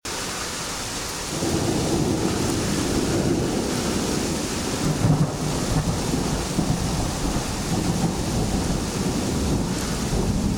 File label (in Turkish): gök gürültüsü ve yağmur